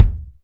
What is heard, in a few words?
DM SIMPLE, KICKS